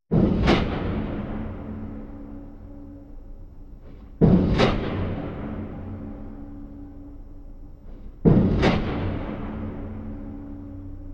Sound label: Slow piler; Quiet Rooms & Other Worlds